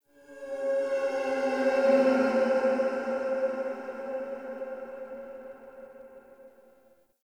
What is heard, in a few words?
Silent Rose Hub Texture, Ambience, Dungeons, Under City